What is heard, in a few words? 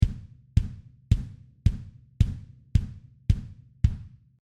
drum